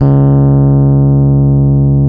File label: C#1, Electric Pianos; Wizoo